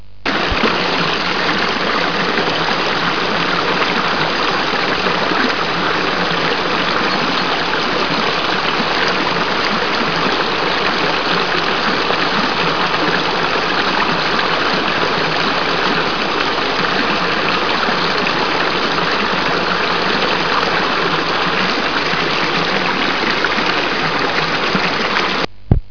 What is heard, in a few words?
brook; germany